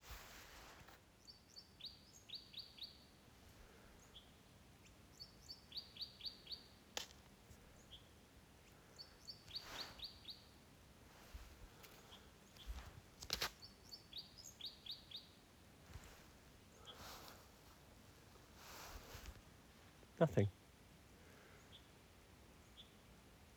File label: bird calls